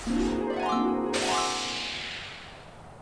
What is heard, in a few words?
Harp - Strong Attack (No Element); Hyrule Warriors Definitive Edition; Sheik's Harp